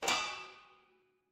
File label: impact metal hit